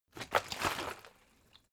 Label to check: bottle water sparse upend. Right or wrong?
Right